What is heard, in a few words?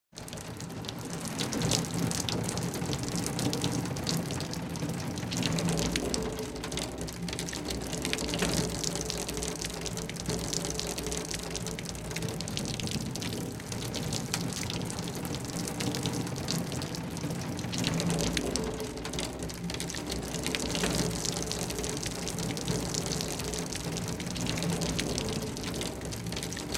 Rain Heavy Rain On Car